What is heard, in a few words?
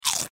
Human Bite Food; Bite Food Chip Quick Chomp; Eating; Blastwave